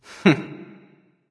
heh heh; erlangshen

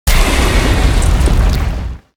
kraken projectile; smite; poseidon

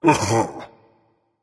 ugh berserker; cuchulainn

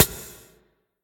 basic hit; smite

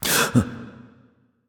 nv breath; smite; xbalanque

